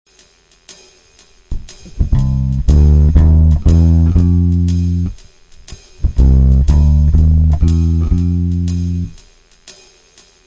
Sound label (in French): quarte, walking bass intervalle quarte